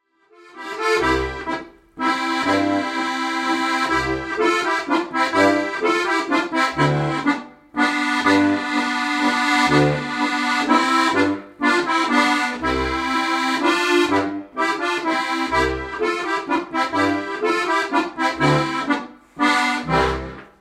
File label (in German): webertanz